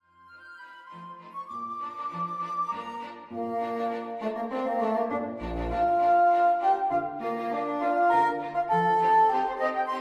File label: the closing bars, String Quartet